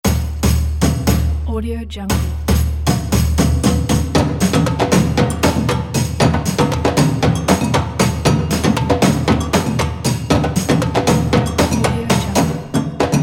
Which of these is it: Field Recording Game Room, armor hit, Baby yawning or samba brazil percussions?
samba brazil percussions